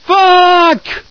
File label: scream, scientist; sven; platoon; dialogs; offensive guys